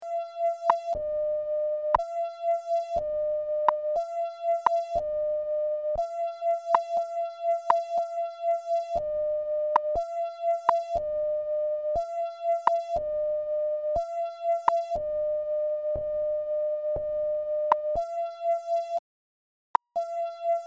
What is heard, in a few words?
SINEWAVE